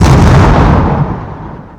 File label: cannon wood impact